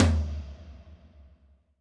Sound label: Tom Floor Wet, Alesis D4